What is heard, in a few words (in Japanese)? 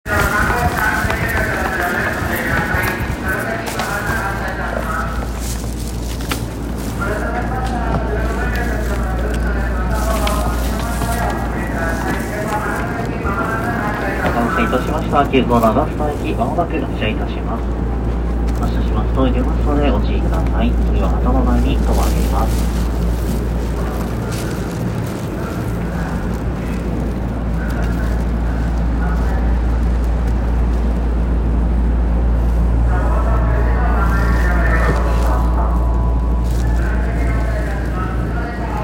大井町線大井町西, train sounds; 東急